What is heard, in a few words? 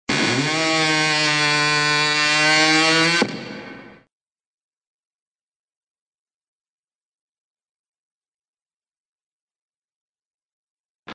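Exaggerated door creaks; Utrirovannye dvernye skripy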